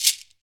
SHAKER, Afro Shakers, Shake&Rattle; Roland LCDP14 Africa VOL-2

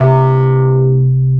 BASS R, Drum & Bass 160 BPM; Ueberschall; Drum & Bass Flash Trackz

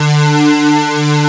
MEMORY UNISON, MOOG; USB Soundscan vol.49 - Analog Fantasies; AKAI

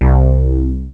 BAZZZ, Yamaha W-7; Rubber Chicken Software Sample Bank

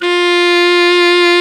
baritone sax F3; InVision Interactive; Lightware VOL-1; Instruments & Percussions

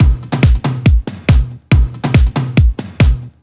Kick Loop, 140bpm; Zero-G Trance Inducer; Drum Loops 140bpm; 90 s Sample CDs

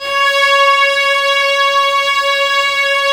STR, Vas4 Amb f; Roland L-CD702; Vlas Sect3-4

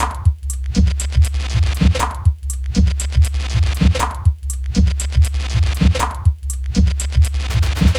Distort Beat, Composite Beats; Sonic Foundry (Sony Creative Software); Myths of Technology

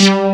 TRON BASS; Pro-Rec - Synthsonic; TRINITY

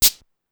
Cabasa, Pitched Up; Clavia DDrum-AT

